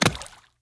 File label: umbrella